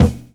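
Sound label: bass drum funk, drum kit; E-Lab; X Static Goldmine 2; akai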